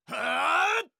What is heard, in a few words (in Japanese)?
攻撃